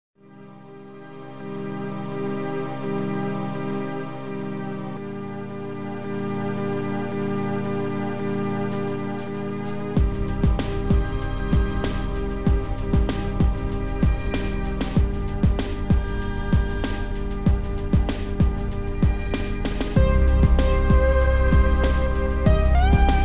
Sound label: prelude; crack-attack